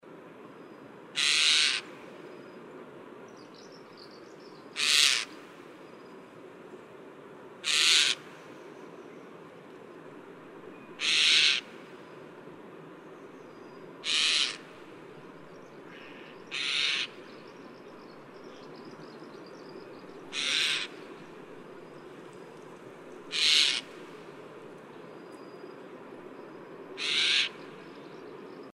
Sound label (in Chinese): 松鴉; 橿鳥; 鴉科/留鳥